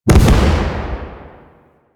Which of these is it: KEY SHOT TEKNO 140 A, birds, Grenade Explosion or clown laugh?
Grenade Explosion